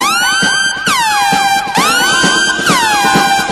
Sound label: Drama Sounds Car Horn